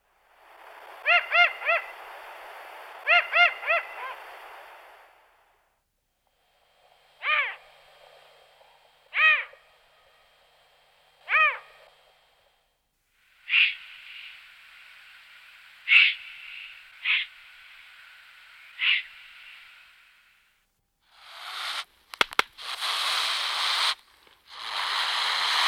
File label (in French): cri grand duc